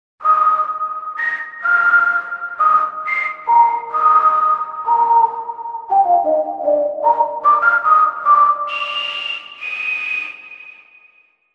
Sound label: Ghost Ld; TURBO VL